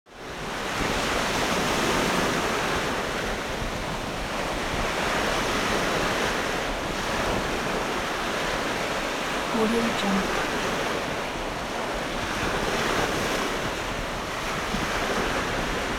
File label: water ocean sea; nature sounds; صدای موج دریا و اقیانو